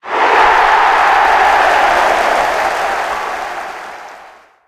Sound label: crowd cheer; source engine; tf; passtime; video games